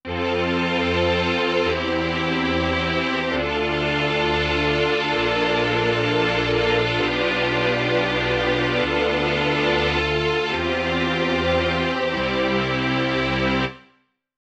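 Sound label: mellotron 16 violins dry sample; clavia nord wave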